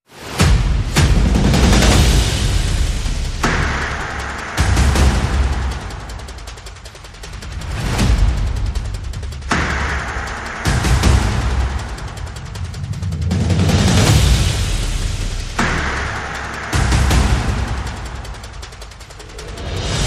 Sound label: Perc and FX